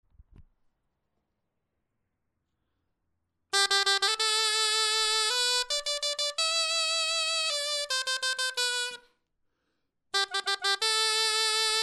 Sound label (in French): clarin etude